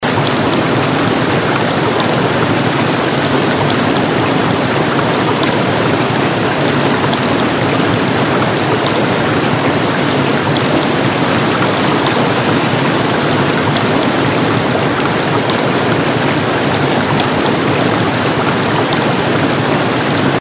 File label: ambient waterfall